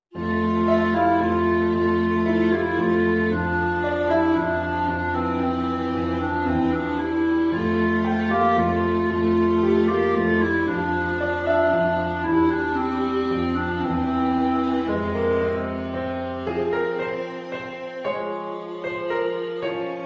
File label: clarinet Viola Vignette